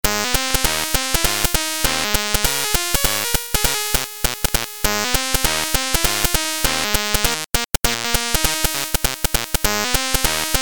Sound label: 1bit rag